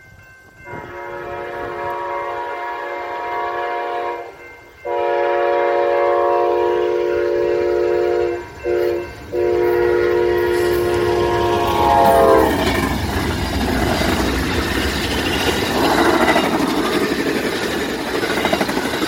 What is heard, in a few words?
Amtrak; nathan K5LA